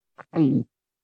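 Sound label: swallowing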